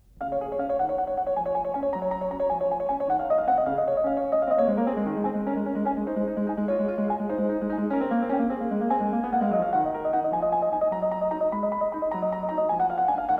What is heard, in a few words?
prelude no. 3 in c sharp major bwv 848